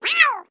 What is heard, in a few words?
Cat Domestic Growl Sc